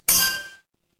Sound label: ui chime